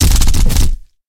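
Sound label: soldier firing a minigun at the jungle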